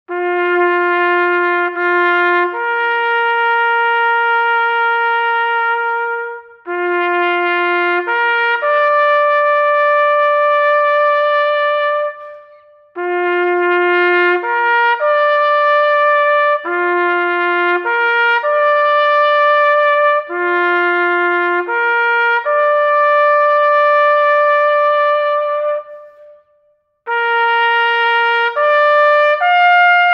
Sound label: Taps